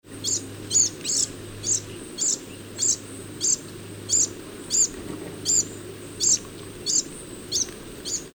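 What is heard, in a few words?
Cowbird Brown Headed Begging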